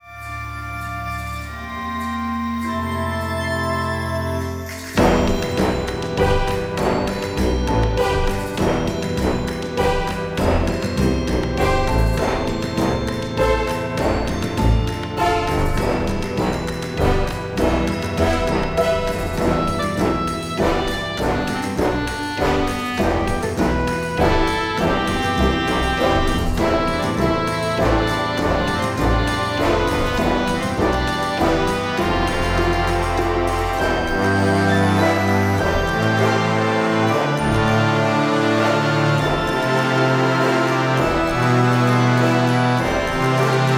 Tension Trailer; music for videogames

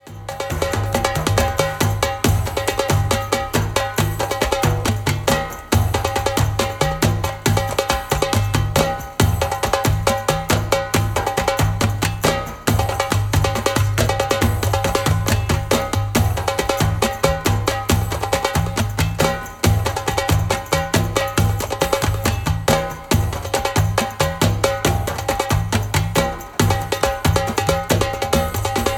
Travel Under the sun Perc; video games

